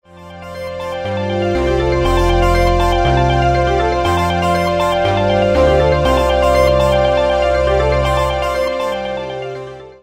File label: Ambient Tekno excerpt